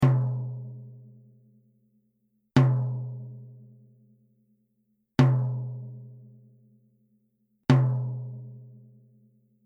TOM Soft; J1 Etched

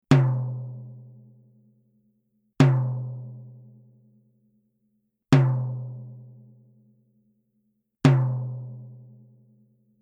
TOM J1Etched Medium

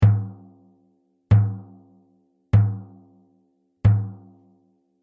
BASS Hard Plastic

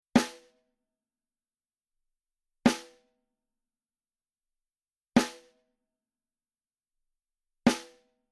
SNARE Center Hard

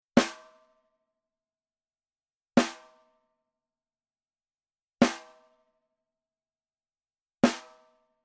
SNARE Coated Center Hard

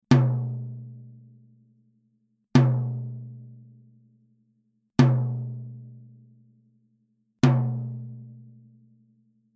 tom clear medium

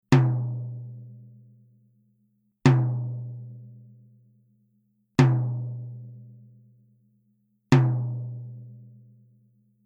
TOM G1 Coated Medium

